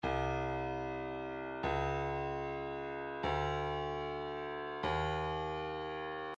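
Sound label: breakbeat midi files 075 079 bpm tambourine; plate tamb 3; Stylus Breakbeat Midi Files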